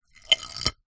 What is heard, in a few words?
industrial ballbearing metal roll inside metal tube